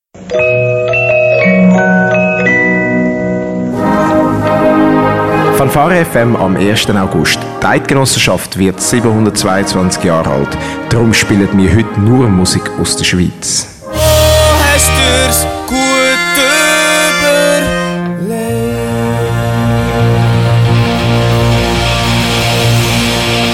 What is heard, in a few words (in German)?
Jingle Musik Schweiz; pfadi radio